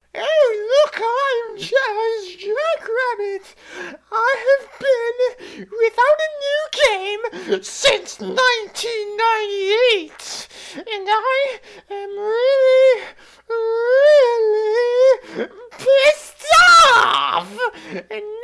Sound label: jazz audition